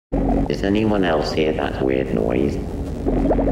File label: weird noise